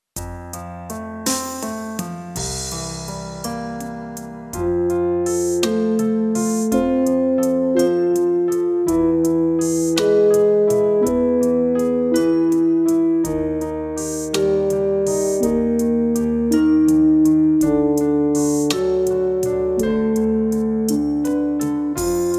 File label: Warm up French horn; Level A